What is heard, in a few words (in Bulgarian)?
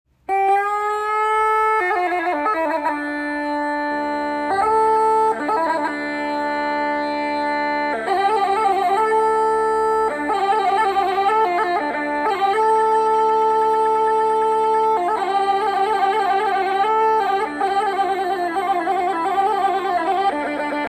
Бавна мелодия и хоро инструментал